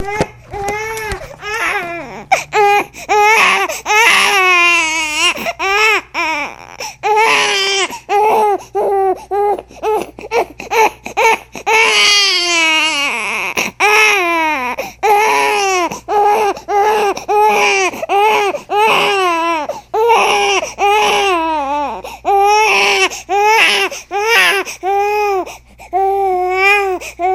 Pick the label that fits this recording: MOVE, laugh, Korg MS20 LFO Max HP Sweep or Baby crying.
Baby crying